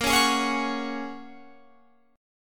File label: Bb Minor 7th Sharp 5th, Guitar, Open F; 22,20,20,20,21,20; strummed; chord shape